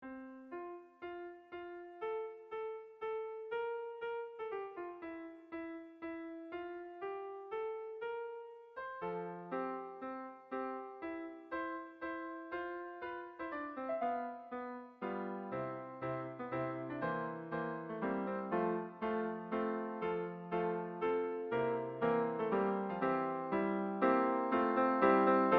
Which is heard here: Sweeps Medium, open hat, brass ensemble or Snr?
brass ensemble